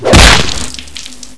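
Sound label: fist hit body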